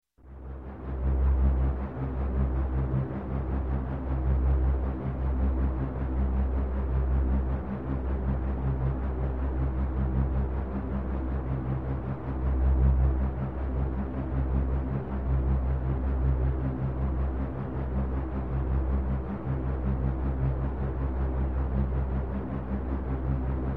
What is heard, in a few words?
Space, Ship Space Ship, Idle, Electronic; Sound Ideas 2000 Series